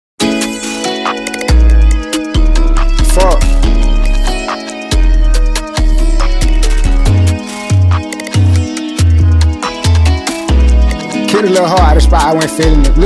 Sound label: Asian Rock